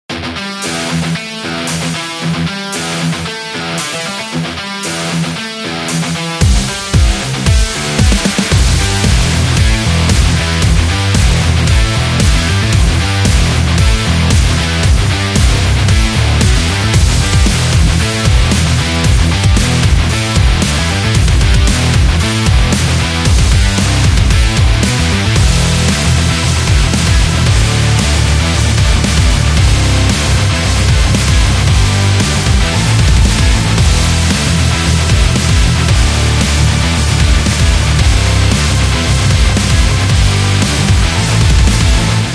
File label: In the Metal